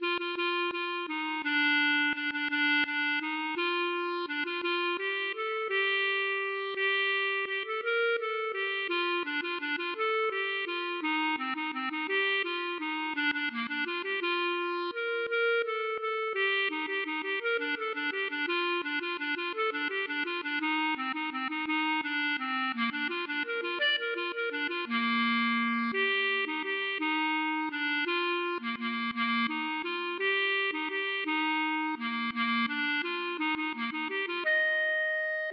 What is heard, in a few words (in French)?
valse